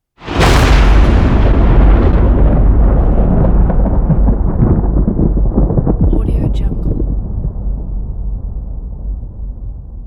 thunder claps

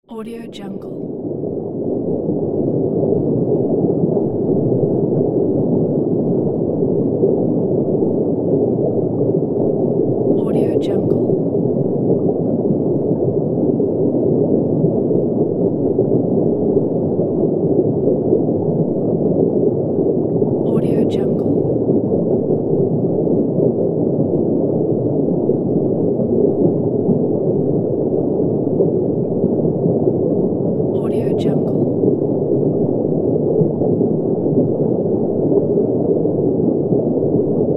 under water rapids; floraphonic

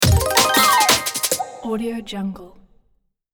podcast logo

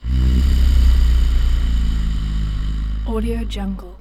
screams shouts monster male clean

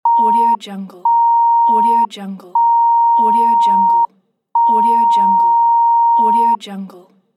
censor beep; rawaudiolab

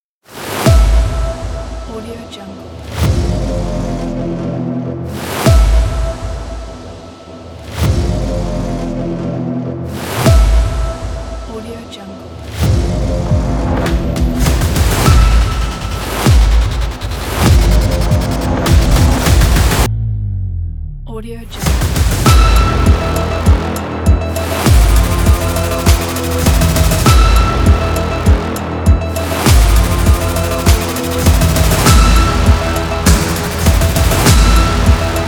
intense hybrid trailer

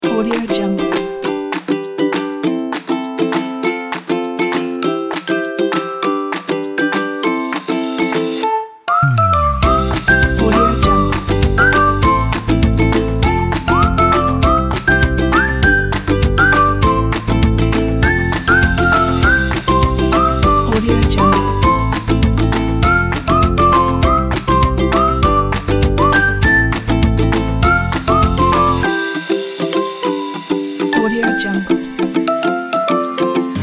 the happy tunes

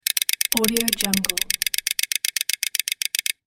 cartoon teeth chatter

